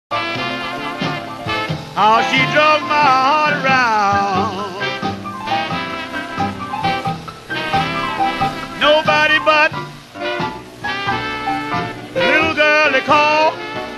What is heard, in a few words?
Blues